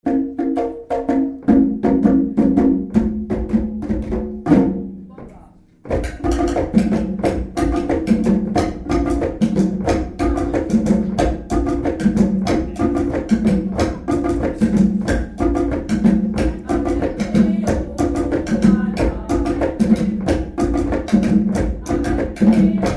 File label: Bomba, trommeln